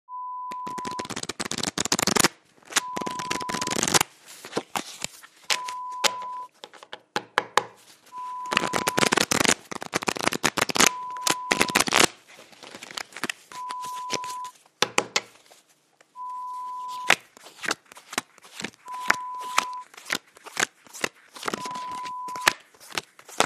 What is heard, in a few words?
Shuffling Cards